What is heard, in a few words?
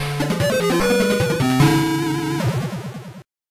SMS Flawless Victory & Game Over; Mortal Kombat II